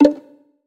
Next Button

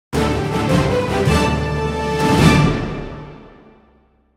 Win Boss Battle

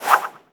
UI Wipe

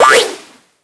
Jump; Skylanders Cloud Patrol; Arcade, iOS, Mobile

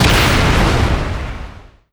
Enemy Candledevil Strongattack Hit

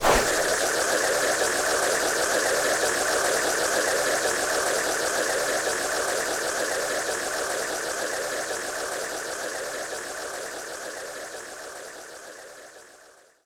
Boomerang Loop

